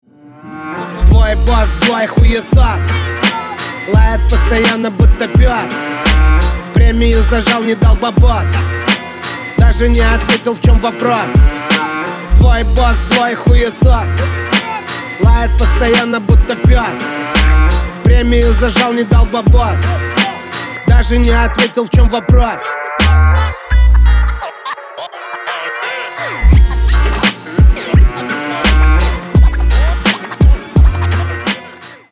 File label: scratch